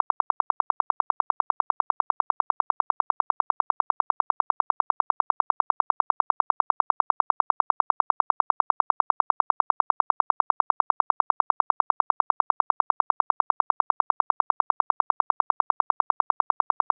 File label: Tone burst; ORL; ORL-přednášky, semináře